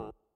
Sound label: Hover Hands